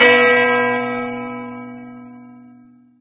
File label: bell; Source Engine; dod